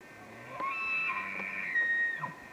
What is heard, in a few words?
big 6x6 bugle, elk